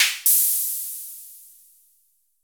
noise pan; Crypton - DrumBase5000

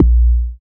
BD ANALOG, Synth

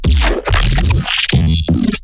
glitch stab; Virtual Riot; Glitch Stabs

